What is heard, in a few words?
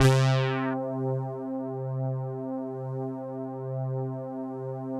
Deep Bass c3, Korg EX-800